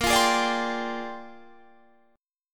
A sharp 13th, Guitar Standard; strummed; JGuitar

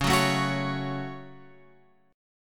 C sharp Suspended 4th Sharp 5th; C#sus4#5; {9 9 7 X 7 9} strummed

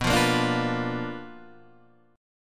B Minor Major 7th Flat 5th, Guitar, Open D

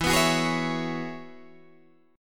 E 6th Add 9th E down Guitar Open D

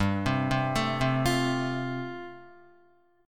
G 9th Suspended 4th, Guitar; arpeggiated; G9sus4

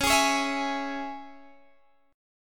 C sharp Minor C sharp Guitar Standard, chord; strummed; JGuitar

